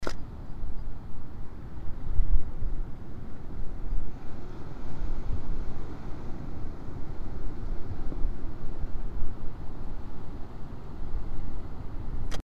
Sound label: Seawall; Minamisoma